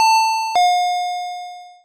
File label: doorchime